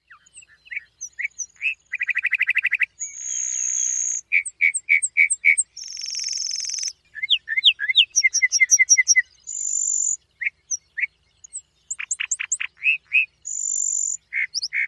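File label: long song, lark bunting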